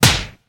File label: slap